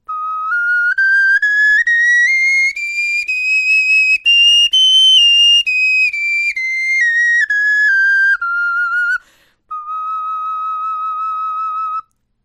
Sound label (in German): tonleiter, knochenfloeten